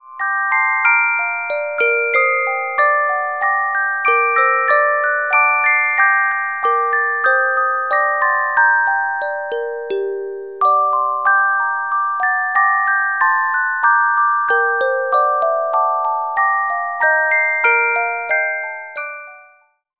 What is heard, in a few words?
Carillon Music Box Melodies